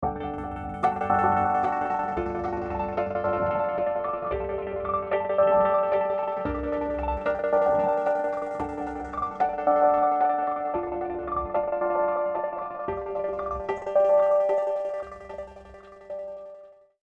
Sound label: High Chords WET